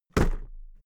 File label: briefcase plastic drop; industrie wirtschaft; bueroarbeit